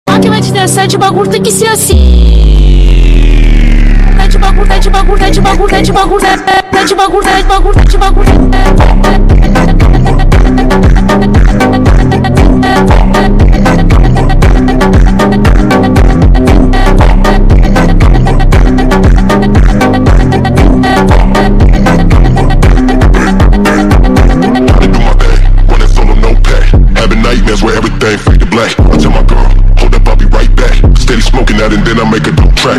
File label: Nunca muda phonk; meme